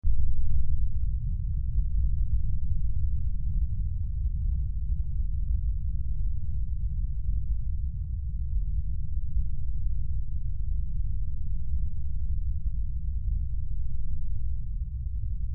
Rumble Earthquake Nature, Earthquake Rumble Drone Ambience; Earthquake Rumble Drone Ambience Atmosphere Sound Design Element; Low Impacts